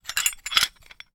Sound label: Hole Saw Rattle, Hole Saw Bits